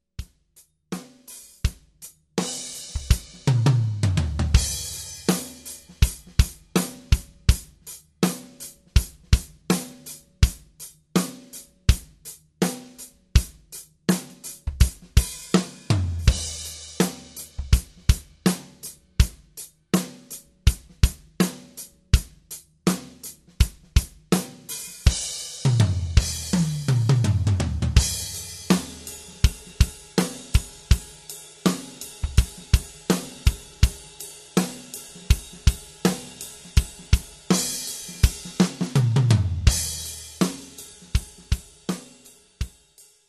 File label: Drum Sounds